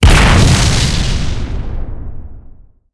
tanks explosion; War Thunder

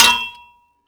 frag grenade bounce hard

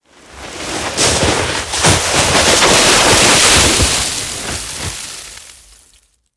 snowmobile icepick skid; cliff; Call of Duty Modern Warfare

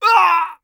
hit; Escape from Tarkov; scav5

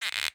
bota bag cork squeak; Rust

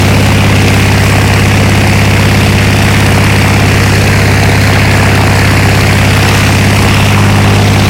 zlin side, engines; War Thunder

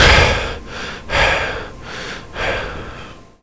breath out; Modern Warfare; weapons; foley

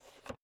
P-51D Cockpit Fuel Shut off On; DCS

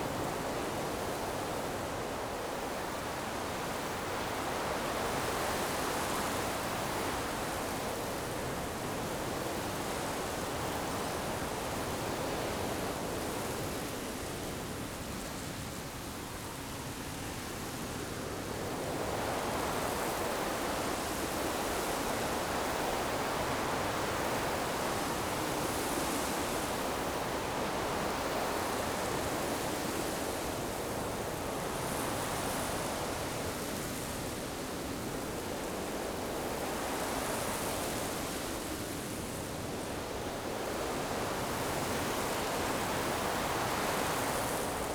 ambience desert; Rust

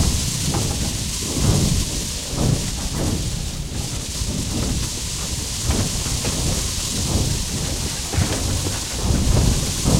air stall loop, engines; War Thunder

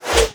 sniper whizzby

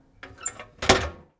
Vehicle Hatches; Red Orchestra Ostfront 41-45

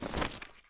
backpack pickup; Escape from Tarkov

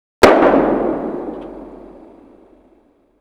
Projectile Sounds; Red Orchestra 41-45

